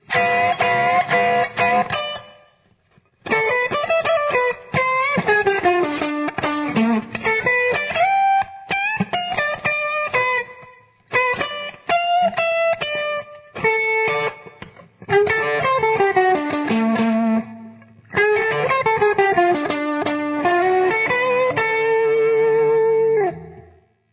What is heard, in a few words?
lead, guitar